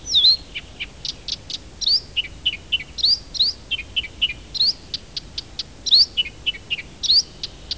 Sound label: Acrocephalus dumetorum